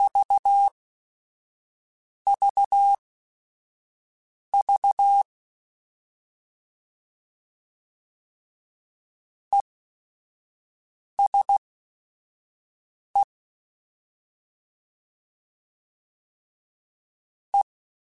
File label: CW Code Groups Suffixes, ESE ERE